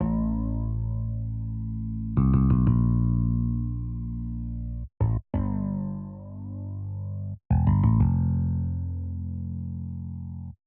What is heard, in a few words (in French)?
compo msm basse